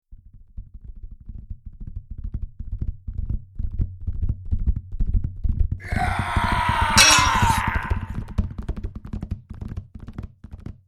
Jousting, Knights on horseback in jousting clash.; Miscellaneous Combat

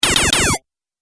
Triple Laser Blaster; Laser Gun Sound Effects; An Orignal Sci-Fi Laser Sound Effect

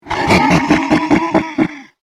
Horror, Devil, Laugh; Evil Laughs

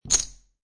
Poker Chips Three Chips Dropping On Felt; Gambling

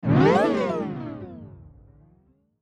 Blurp Piano; Strange Tone; Strange Tone Sound Effects

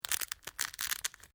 DRY BRITTLE SNAPPING; Various Organic Foley sounds; Human

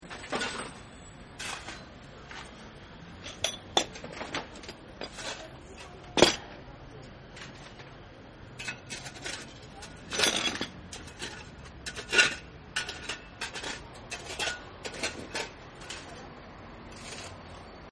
Shoveling In Street; Cities; Backgrounds; City

